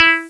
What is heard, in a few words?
Muted Guitar E4; Hexen Demo; Hexen Music; Hexen 6vox